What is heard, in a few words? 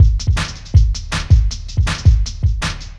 DRUMLOOP D+B 160, Drum Bass Loop; eJay